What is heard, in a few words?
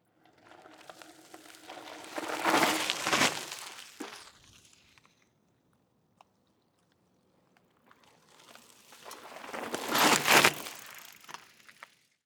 Mountain Bike Brake Skid on Wet Dirt; Wav Junction Sound Effects; Bicycles